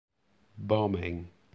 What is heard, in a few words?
bombing